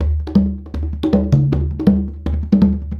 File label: Speedy Congalas; Essential Percussion